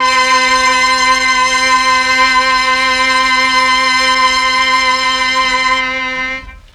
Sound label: MEL STR, Legendary M400